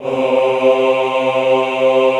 AHH C2, Classical Choir; Best Service; Extended Classical Choir

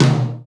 TOM, KIT Real-Kit

